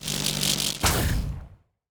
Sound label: electric golem strike